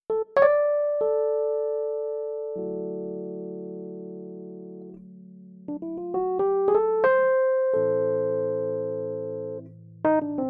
CA95 EPiano

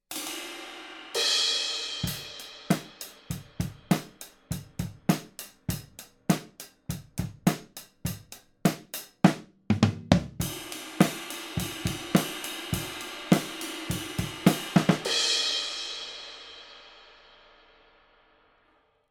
Drums Front of Kit Cardioid; Neumann U67

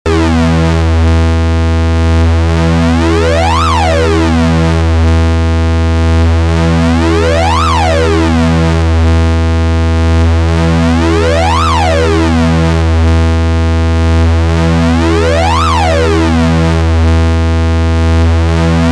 Novation Summit Sync Novation vs Moog

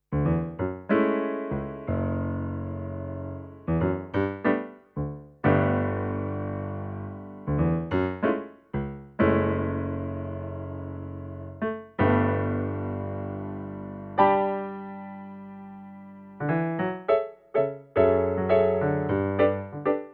Mellow Piano

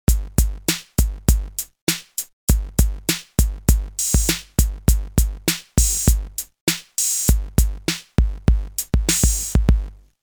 SP 707; 808; SP1200

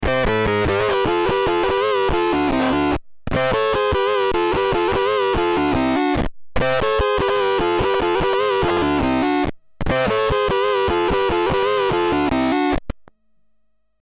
Swarm Fuzz Pedal Worker Queen Drone; Beetronics

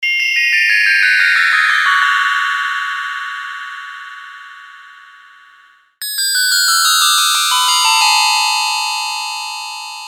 bells

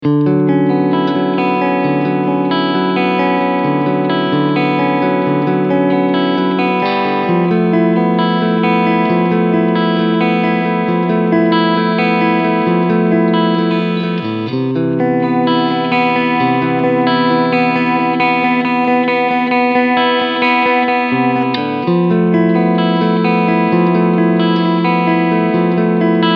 Clean Font SC Mitte SC

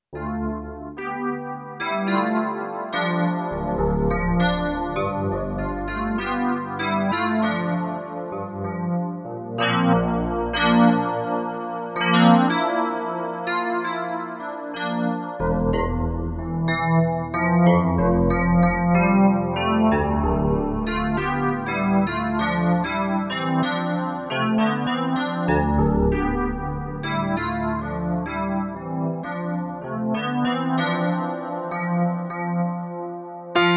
DX Piano

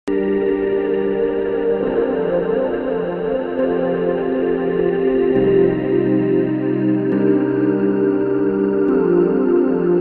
Noisy Formant Filterpad Dry2Wet

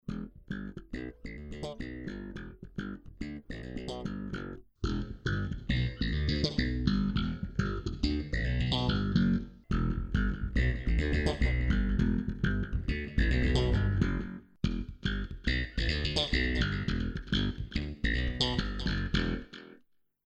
slap; SKC pur

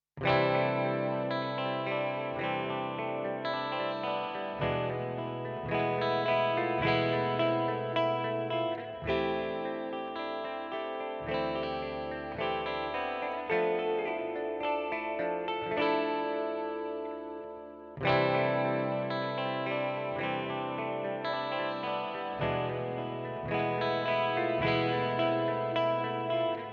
Hall Reverb; Neunaber Immerse Reverberator MKII

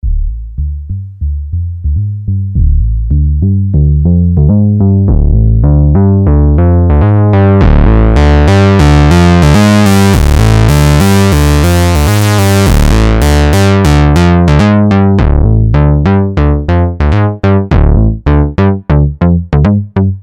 Monark Bass Seq

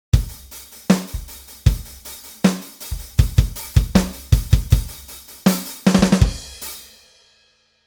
Ezdrummer Drum Groove

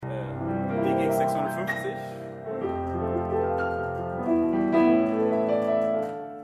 Yamaha DGX 650

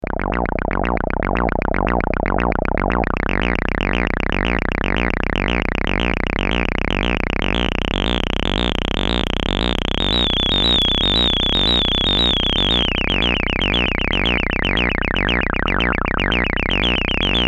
Cybersound T1 303 like

